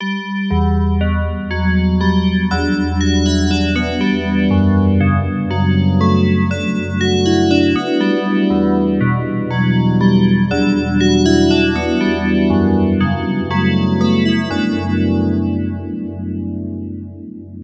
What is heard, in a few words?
DX7 bells, stereo polyphase